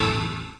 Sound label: HIT FLNG, Kawai R50; DRUM MACHINES